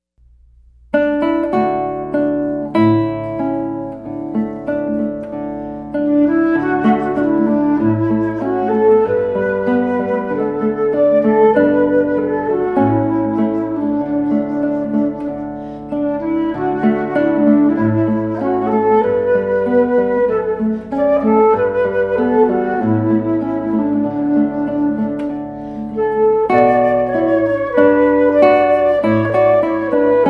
flute; Down By the Salley Gardens

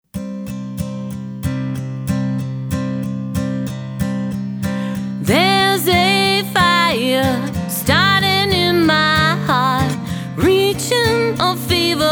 Acoustic; cover band

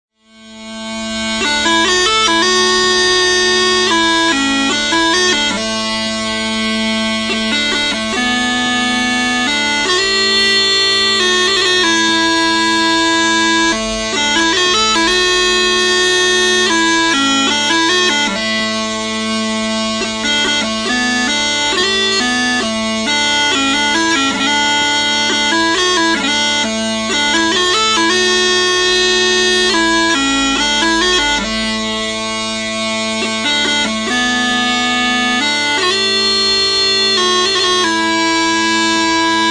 Song for the Small Pipe on Scottish small pipes; Bagpipes

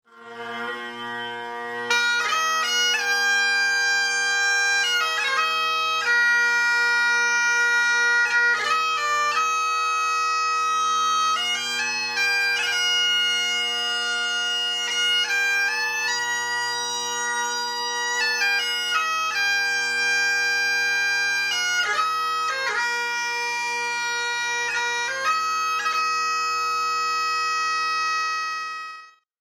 The Water is Wide, bagpipes; Hampton Pipes And Drums

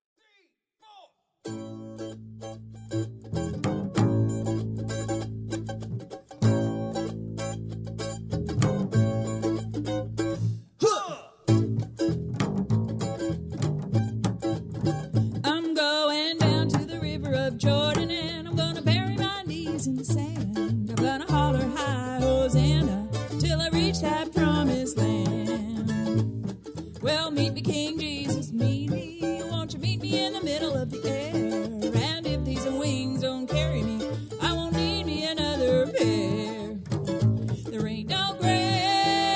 duo, bluegrass band

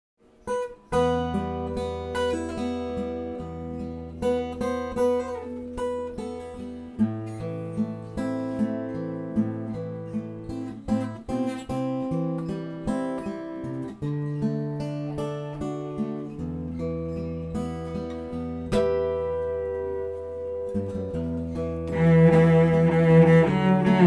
Cello Guitar Duo

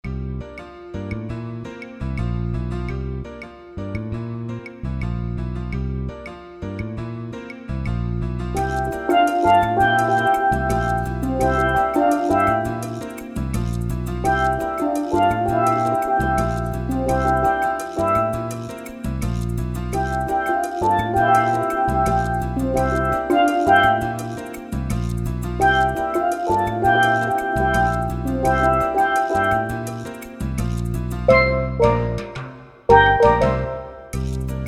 solo, Caribbean

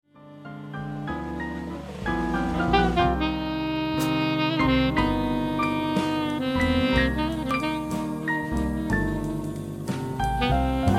quartet, Jazz Band

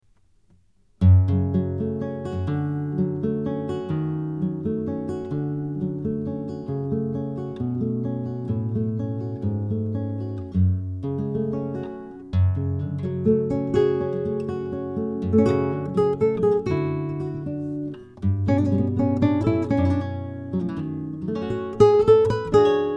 Flamenco, Spanish Guitar Duo; Sevilla

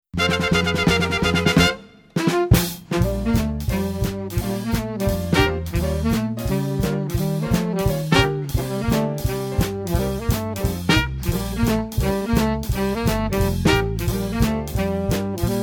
Combo, Swing Band

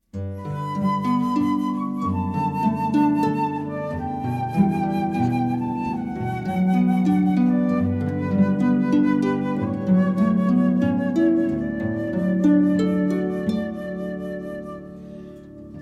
classical harp; Jesses Carol

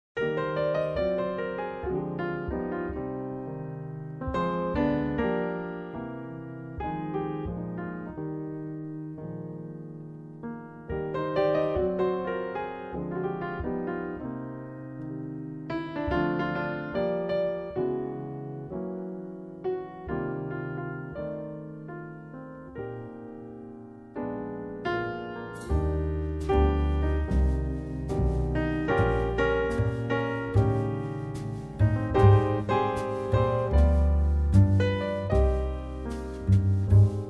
Trio, Piano

